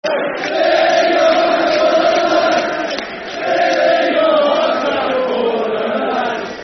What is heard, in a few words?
Sing Yer Hearts Out, Football Chant; Yeovil Town Ytfc; fanchants